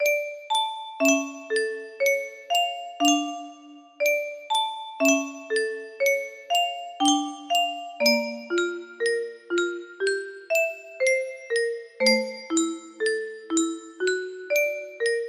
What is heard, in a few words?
acoustic grand piano